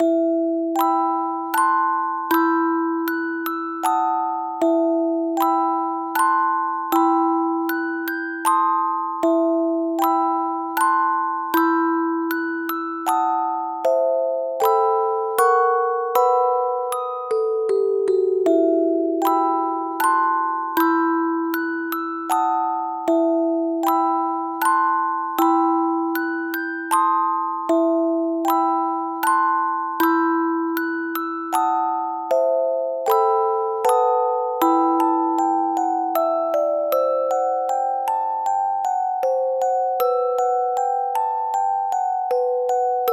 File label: music box dark